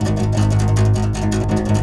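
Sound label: contrabass balalaika, Plucked Strings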